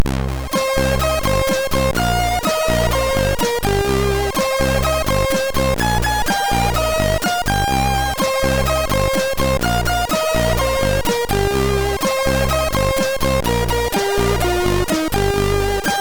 sid MOS6581R3; Average; Shock

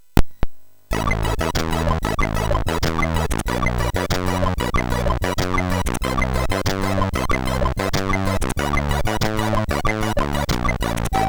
New Bossa, MOS6581R3

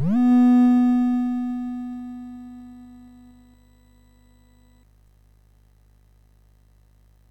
Project Firestart, MOS6581R4

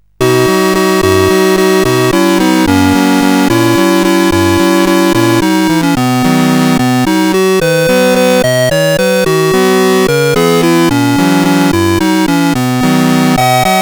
Tum Balalaika, CSG8580R5

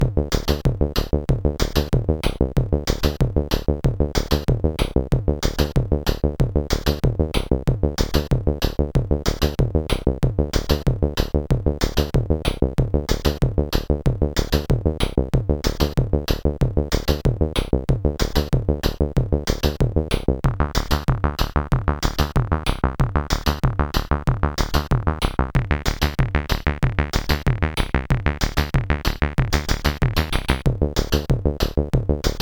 CSG8580R5, GAMES; Shotgun

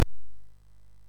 MOS6581R3, GAMES; Spikes Peak

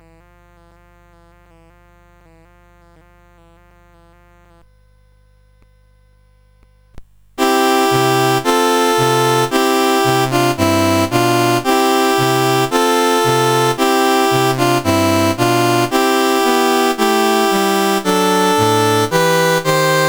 O Sanctissima, CSG8580R5

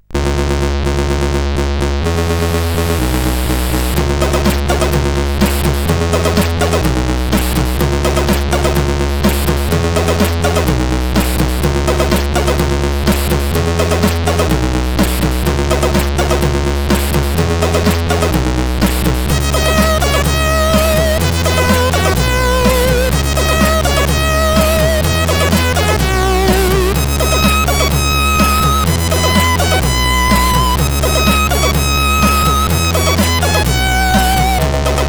Tune 3, sid, CSG8580R5

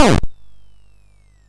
Frantic, MOS6581R4

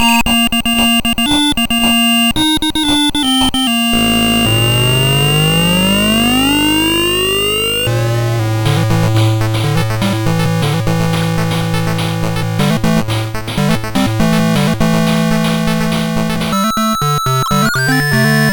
Musicbox, sid MOS6581R4

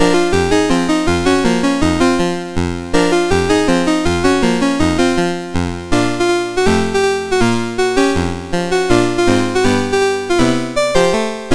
Ragtime, MOS6581

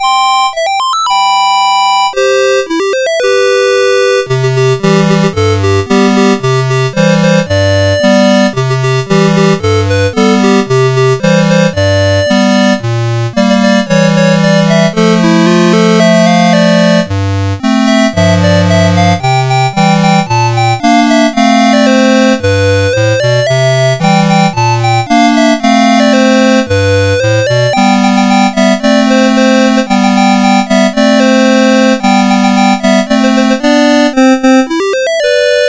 Cheers, CSG8580R5

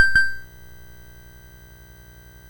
BASIC sid MOS6581R4, GAMES; Sword of Fargoal

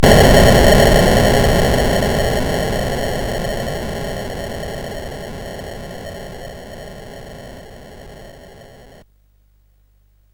Frantic, CSG8580R5

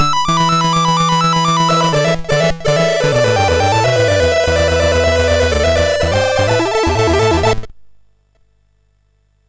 sid MOS6581R3, GAMES; Continental Circus

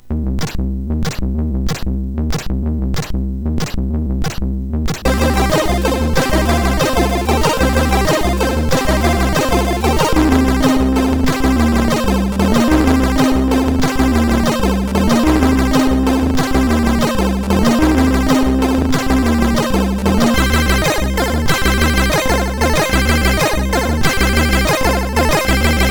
Street Jumpin sid MOS6581R4

